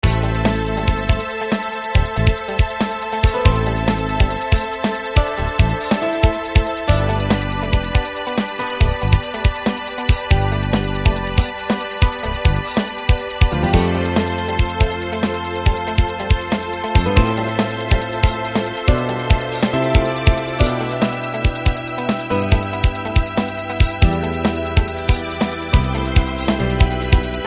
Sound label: Pop dnb; loops; flashkit